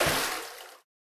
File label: water